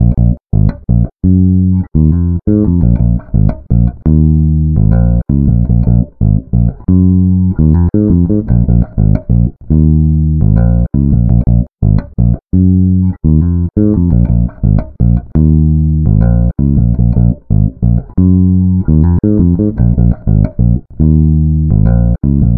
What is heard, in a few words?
Bass, Electro Lounge; Sonic Foundry (Sony Creative Software)